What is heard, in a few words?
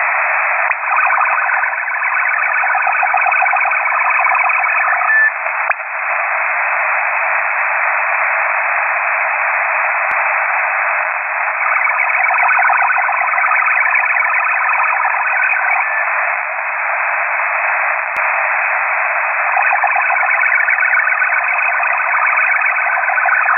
mil 188 141a ale